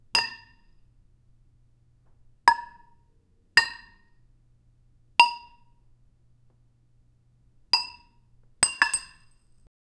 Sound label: two wooden beer mugs full